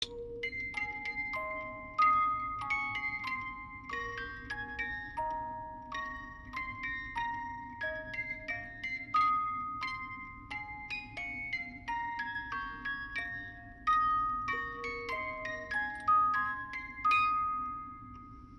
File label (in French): 18 lames il etait un petit navire, boite musique manivelles